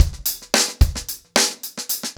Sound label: Hip Hop 110BPM, acoustic drums drumloops